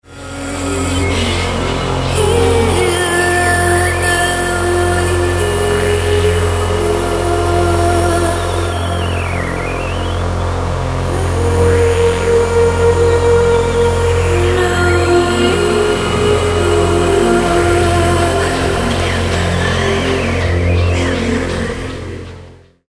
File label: distort